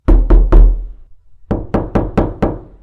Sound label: knock door